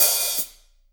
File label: HH hh; Best Service Gigapack 2